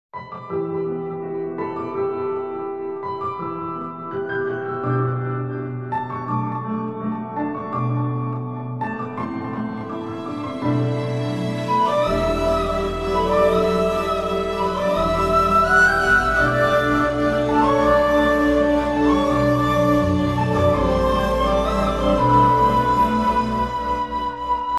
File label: instrumental music ringtone